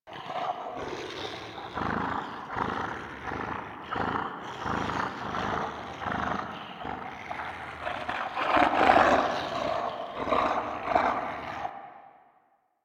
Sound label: Shroud Loop; Warframe; Voruna